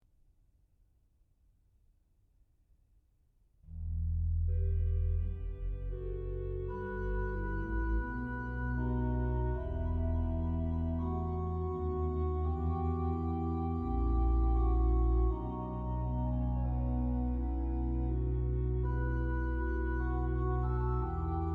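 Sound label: Klag Lied, organ; 1973; Marcussen; Rotterdam; Buxtehude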